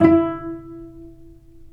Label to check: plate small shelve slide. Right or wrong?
Wrong